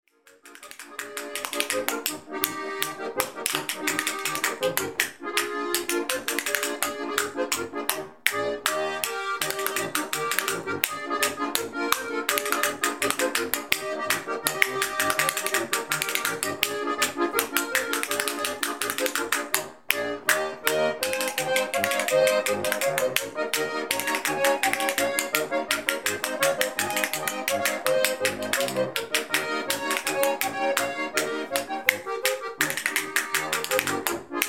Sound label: polka